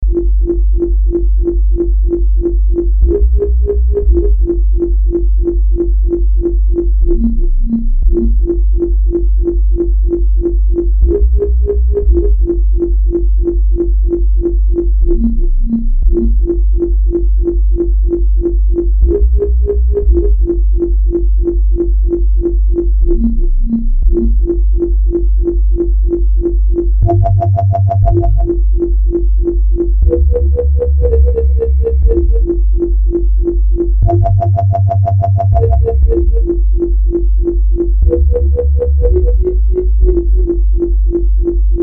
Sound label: CMI V Additive Wobbler; Arturia